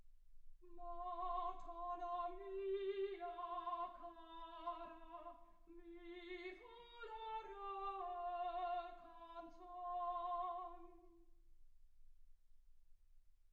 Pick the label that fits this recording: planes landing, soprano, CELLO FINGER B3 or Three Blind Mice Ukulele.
soprano